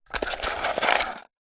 household jewelry and small makeup items pick up out from wood container